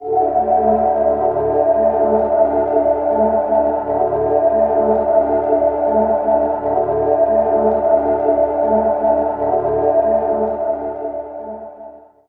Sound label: Major Fog D, Ambient Pads; Zero-G Seismic Frequencies; 90 s Sample CDs